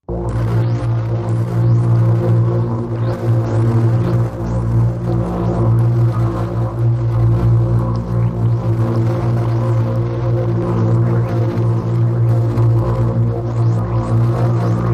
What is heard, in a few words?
Solace Nebula Machine, sci-fi ambience